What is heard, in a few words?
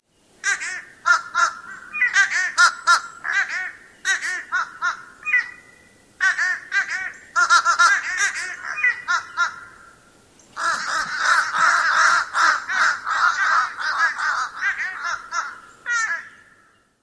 group calling